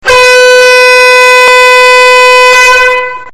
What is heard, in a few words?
Soccer Horn